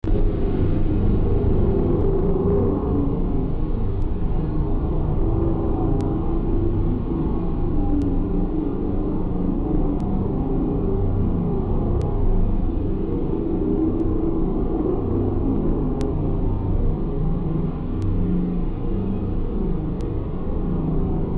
moteur; ambience

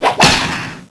fouet

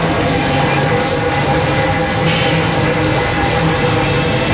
reacteur

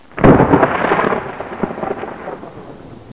orage

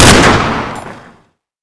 PSG1 autre tir

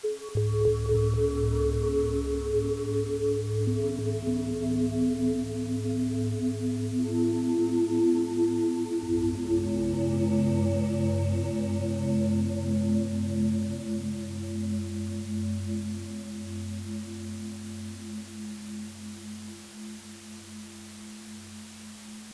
son peur endroit sombre

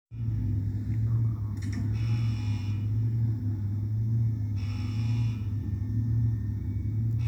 Fond alarme

